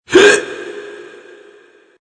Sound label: Hiccup Echo, Male hiccup, inhaling, long, w. FX; Hiccupping; Human; (human voice)